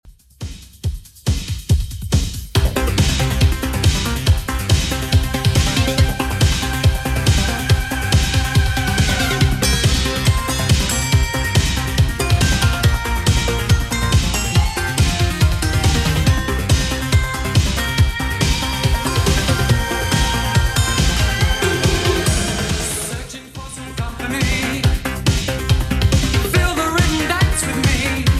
80s Redrum 140bpm

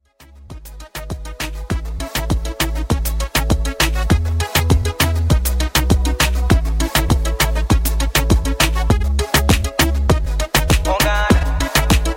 Reggae Moombah 100Bpm

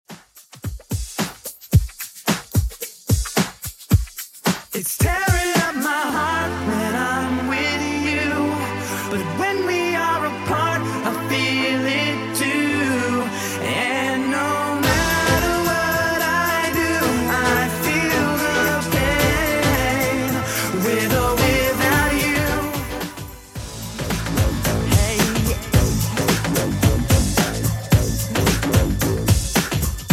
90s Redrum 110bpm

